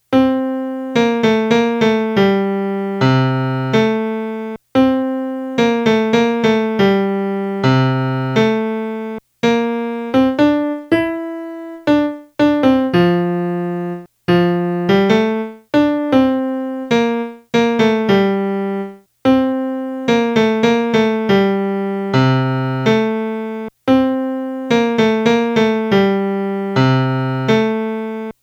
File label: melody; southern songs